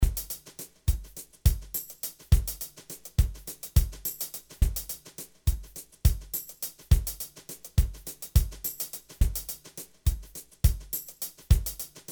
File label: Deck the Halls drum track